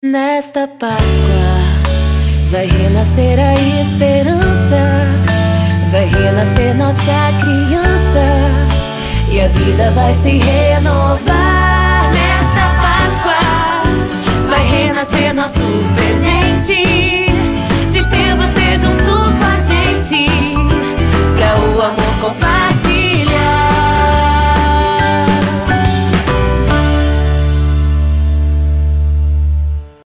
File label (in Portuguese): Jingle Páscoa sem assinatura